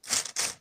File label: paper